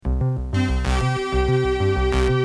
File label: ringtone